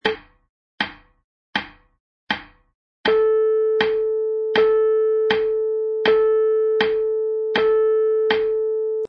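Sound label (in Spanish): blancas, piano midi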